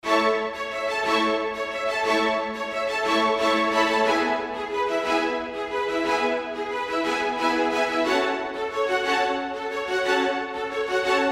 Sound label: Cinematic Orchestral Classic Intro